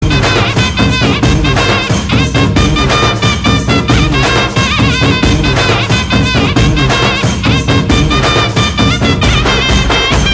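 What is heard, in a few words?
background music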